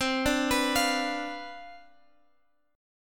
C Major 7th Suspended 2nd Suspended 4th C down Guitar Open G, chord